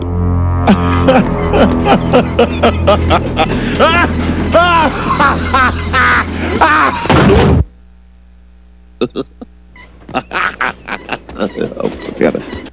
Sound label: laugh; LAUGHING